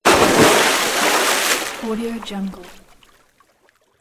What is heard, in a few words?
big water splash